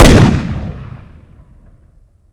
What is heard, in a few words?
shotgun fire; weapons